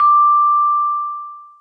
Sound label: VIBE D6, VIBRAPHONE; Greytsounds VOL-1